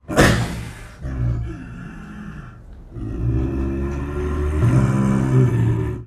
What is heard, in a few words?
Leopard Snow Growls; big cats